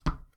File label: step, Footsteps; OwlishMedia; SoundEffectPack